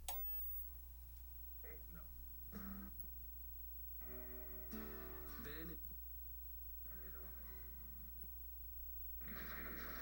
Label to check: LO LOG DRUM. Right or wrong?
Wrong